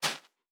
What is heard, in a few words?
Footstep Sand Running